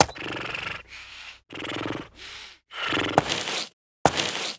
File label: Unicorn idle; Seer; GamigoNA